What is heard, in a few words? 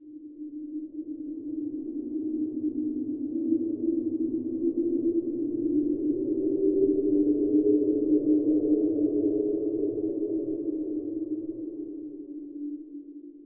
wind scary